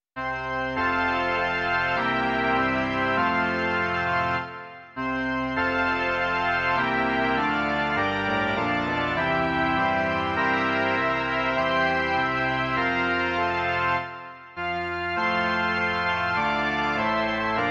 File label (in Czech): varhany